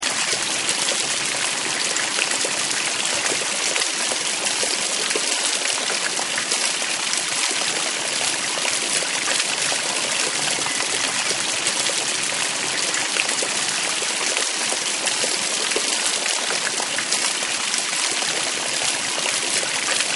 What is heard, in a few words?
rain thunder sounds